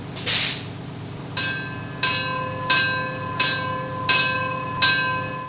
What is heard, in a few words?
bell